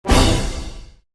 (Jingle) Invasion Failed

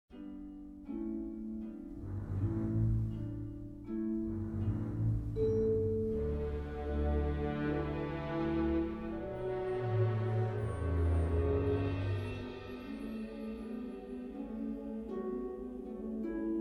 World Music; Jurassic World Evolution 2; PS4 PS5 Windows Xbox One Xbox Series X/S

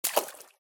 walk in water; Poppy Playtime

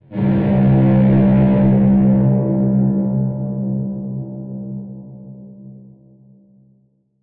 Danger Flourish; Insanely Twisted Shadow Planet

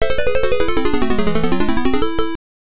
Captive is Shot; galaga msx

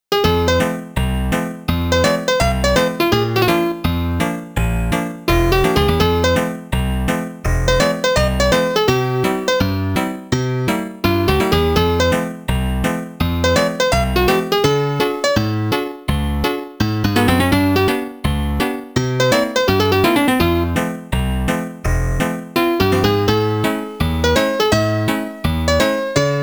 Ragtime; Australian Pioneers; amiga

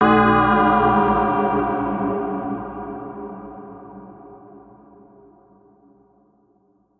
Music Darkbell Bend; Fortnite Save the World

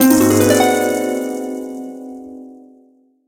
Sting

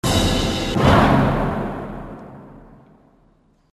all coins; tempala

